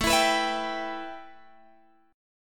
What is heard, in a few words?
A 7th Suspended 2nd, A, down, Guitar, Open G